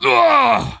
Wound Medium; Putress

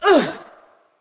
Creature Attack Medium; Eydis

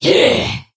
Attack; Tenebron

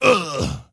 wound; Skadi The Ruthless

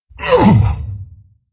Wound, Creature; Steelbreaker

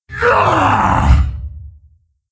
Attack, Creature; Steelbreaker

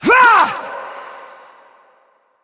Attack; Creature; Bolvar Fordragon

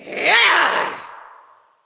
Creature Attack Medium; Fjola

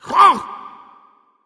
Roar Attack

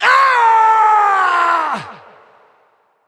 Wound; Bolvar Fordragon

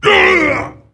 Wound Heavy; Jaraxxus

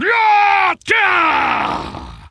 Attack Heavy; Putress

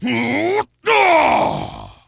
Attack Medium; Putress

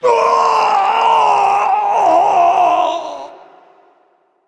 Death; Creature; Bolvar Fordragon

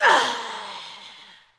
Wound Critical, Creature; Fjola

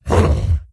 Crusher Attack; AnubarCrusher

